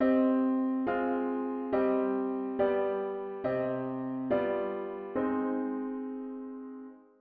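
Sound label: diatonic inverted V7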